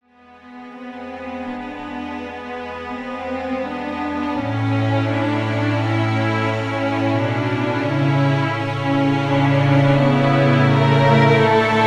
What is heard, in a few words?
Adagio in D minor; hoi2; Stalingrad